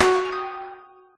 finish line; Minecraft

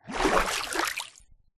jump, dolphin; mob; Minecraft

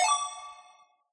point; Minecraft; chippy

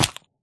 jump egg, turtle; mob; Minecraft

